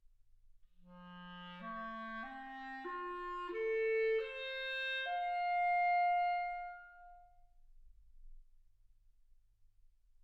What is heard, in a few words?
clarinet